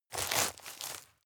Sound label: step leaves heavy boots